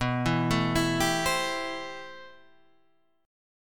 A-Minor 7th B; Am7/B arpeggiated; JGuitar

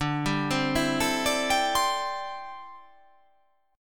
D 7th Suspended 2nd Suspended 4th D

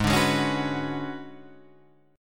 B Minor 6th Add 9th G sharp

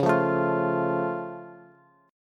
C sharp Augmented down Bass, chord

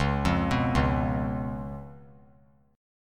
Db 7th Db down; Db7 arpeggiated; JGuitar

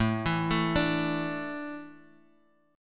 A Suspended 4th A, chord

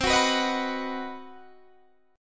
C Minor Major 7th Flat 5th chord, Guitar Open D

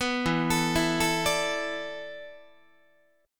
E 7th Suspended 4th, Guitar; E7sus4; arpeggiated; 14,12,12,14,13,13

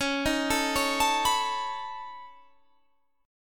C sharp 7th Suspended 2nd Sharp 5th C sharp down

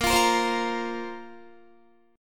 Bb Suspended 2nd Bb down Guitar; Bbsus2 strummed

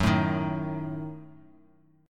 Gb Minor 6th; strummed; JGuitar

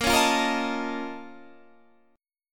A sharp Minor Major 11th, A sharp, down

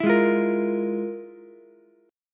F Minor Major 11th, Ukulele; strummed; JGuitar

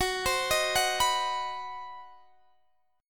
C Minor Major 7th Flat 5th Gb

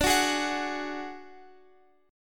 D Major Flat 5th D Guitar Open F, chord; strummed; JGuitar

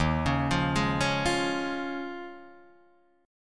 Eb 9th Suspended 4th, Guitar, Drop D

